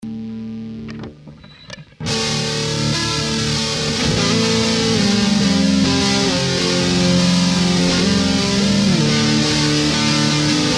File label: In A With D Bass